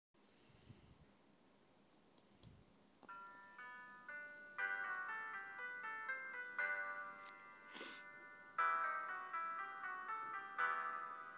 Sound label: piano; ハ長調